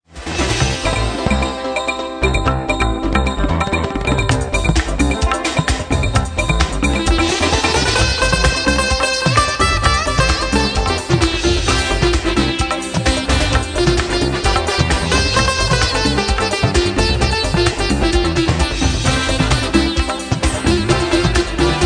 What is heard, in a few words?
sitar